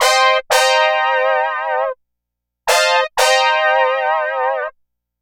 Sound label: Disco Brass; Dr. Fink's Funk Factory